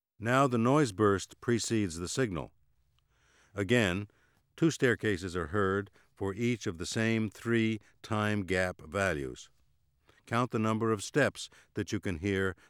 Backward and forward masking, Auditory Demonstrations